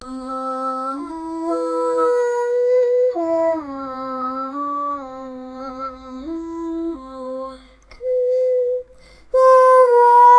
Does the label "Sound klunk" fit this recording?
No